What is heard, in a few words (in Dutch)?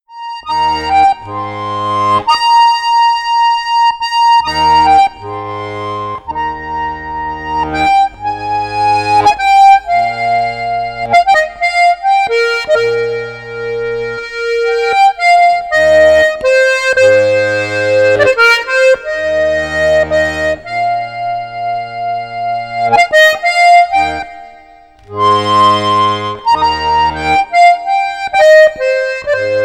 Harmonica Castagnari in D Dis; The Parting Glass; Staalkaart